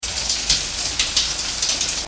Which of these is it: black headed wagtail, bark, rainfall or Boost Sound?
rainfall